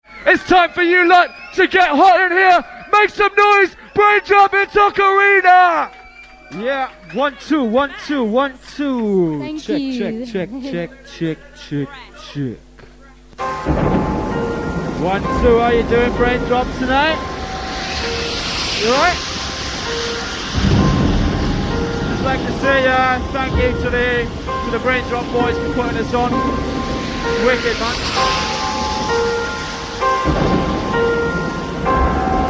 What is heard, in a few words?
Ocarina